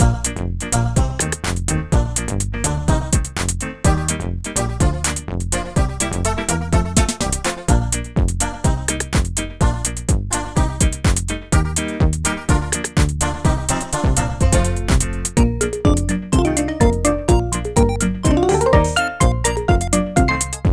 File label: M Mini Game Running; zoo frenzy; dsiware; nds